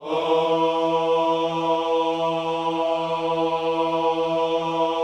OHS E 3C, gospel vocal; Spectrasonics; Vocal Planet Vol.1 Gospel; akai

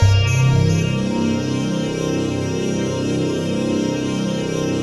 ATMOPAD; Best Service; XX Large; Pads

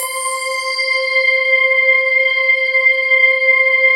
PAD, ATMO PADS; Best Service; XX Large

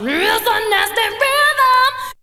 VOC; ZERO-G; Datafile

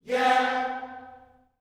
"yeah" C 4, Gospel; Spectrasonics; Vocal Planet Vol.1 Gospel; akai

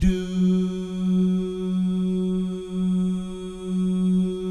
"DOO" GUYS, Vocal Jazz Fx

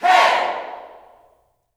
"Hey", Vocal Gospel yell shout; Spectrasonics; Vocal Planet Vol.1 Gospel; akai

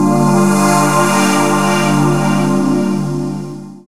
SYNTH

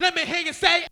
VOX; ZERO-G; Datafile

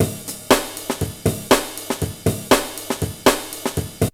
BREAK

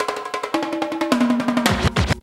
PERC; ZERO-G; Datafile

